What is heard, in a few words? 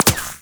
Shield Impact